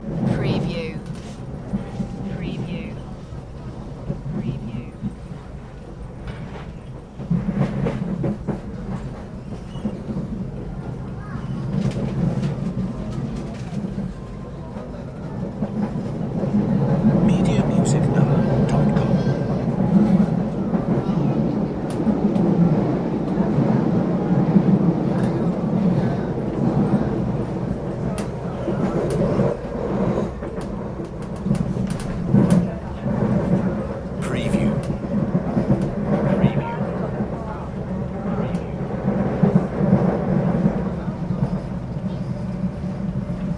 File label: train ride ambience loop; Vehicle / Transport Sounds / Trains / Subway Sounds